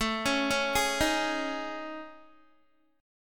A Major 7th Flat 5th, Guitar, chord; AM7b5 arpeggiated; JGuitar

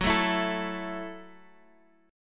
F sharp Minor 7th F sharp; F#m7 strummed; x,9,7,9,10,x

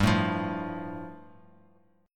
G 7th Suspended 2nd; strummed; JGuitar

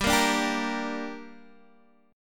G Minor G down Guitar Open D, chord

